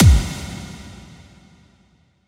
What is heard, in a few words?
Impact